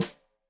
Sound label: Snare Stereo, Drums; Deeply Sampled Drums; SMDrum